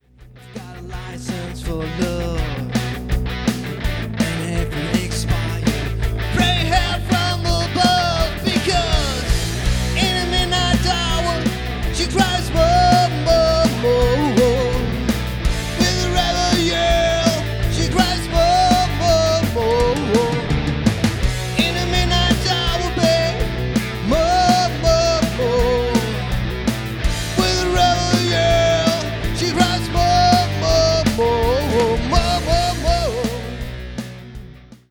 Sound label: rebel yell